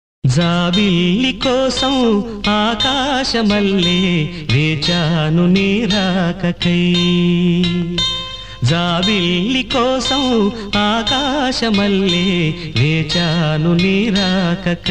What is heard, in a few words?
Male ringtone